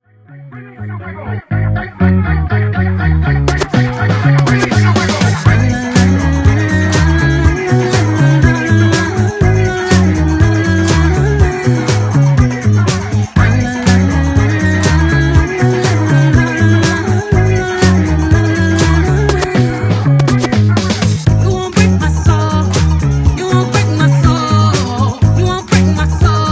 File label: Funk Mashup Remix, 121 Bpm